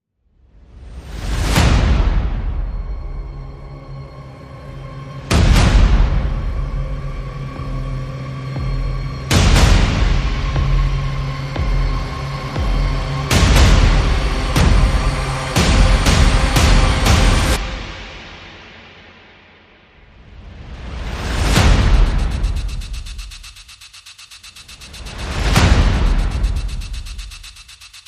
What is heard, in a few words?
Percussion and Effects